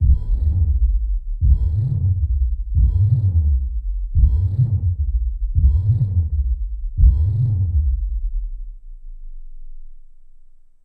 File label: Starship Shields Up